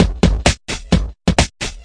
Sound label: 130 bpm crunchy, drum loop; 121 to 130 bpm